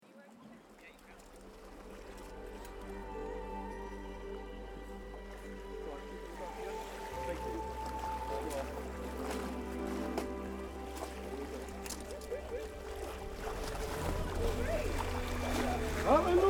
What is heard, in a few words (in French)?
Plongeon